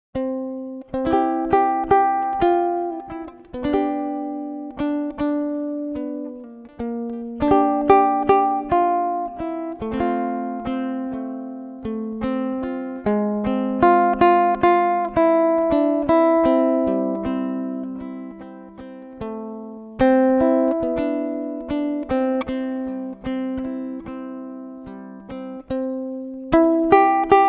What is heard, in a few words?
Ukulele